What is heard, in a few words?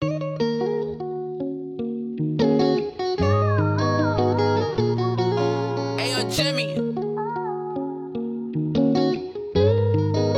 Guitar; Dzimi Guitar Samples Vol2